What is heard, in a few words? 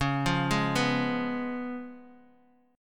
Db 7th Db down Guitar DADGAD